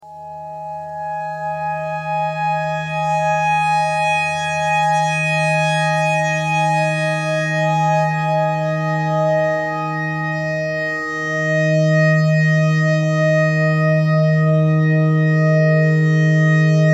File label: clarinet notes e clarinet notes e thread simple